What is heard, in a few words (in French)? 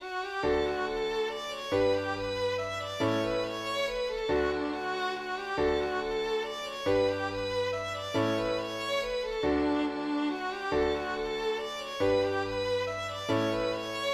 mazurka